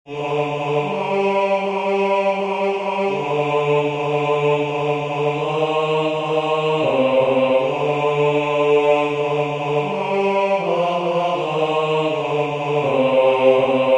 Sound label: Bass